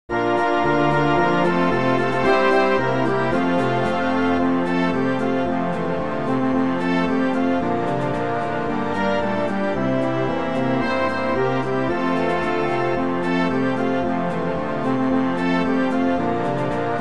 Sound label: Jingle Bells